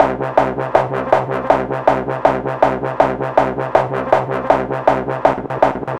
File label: Beats Idle Loop; Anger Foot